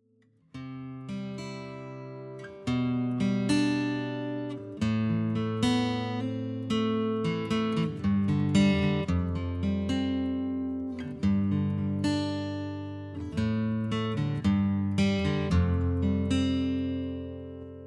akustisch

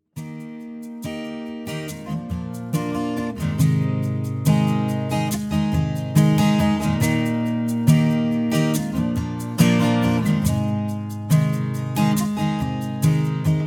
shaker

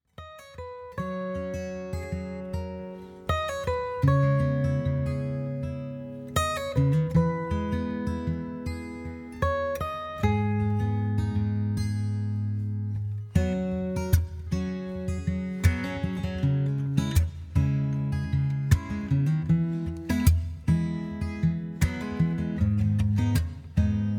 B-Dur

